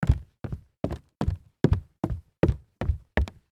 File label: jog on wood